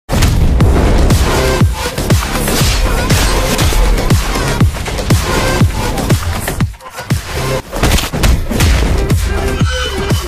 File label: Teaser Bgm Ringtone; Marco; Unni Mukundan